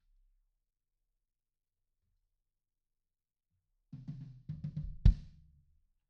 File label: BD IN